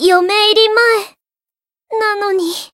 retire, jp

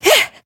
Voice attack

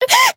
damaged

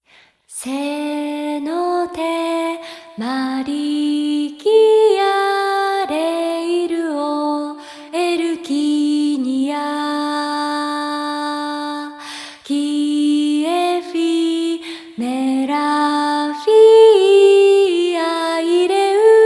bgm song solo; event merina

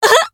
Voice damaged

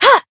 Voice attack; isuka

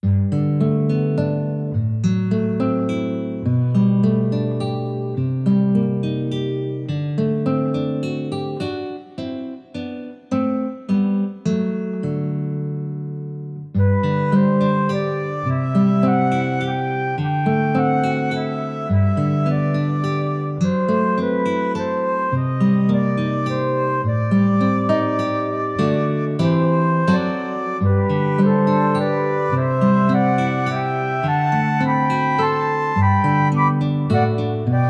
town general modern

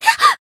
damaged, jp

